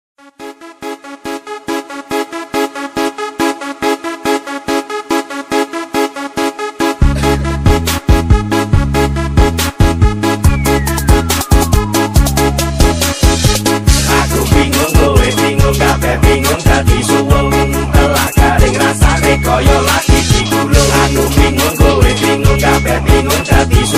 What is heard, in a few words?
boombox